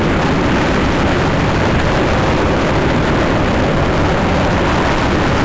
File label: F15 Dist Airflow Low; DCS